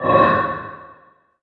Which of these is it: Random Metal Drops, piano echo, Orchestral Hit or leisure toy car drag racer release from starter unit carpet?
Random Metal Drops